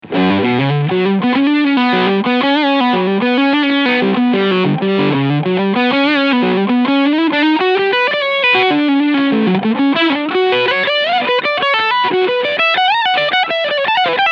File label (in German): MXR Timmy Switch Rechts symmetrical Clipping Full Gain